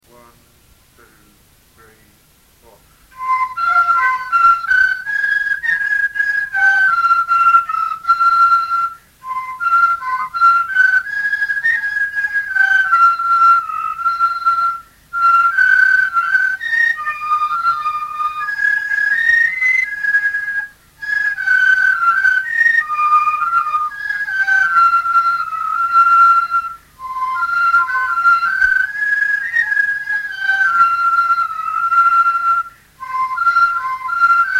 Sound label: fifer